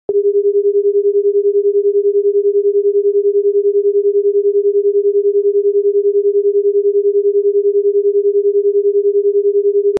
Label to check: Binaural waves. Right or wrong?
Right